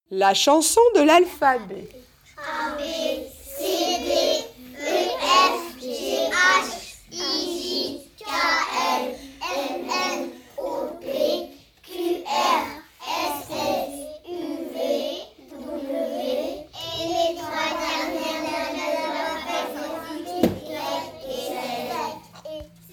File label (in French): la chanson de l'alphabet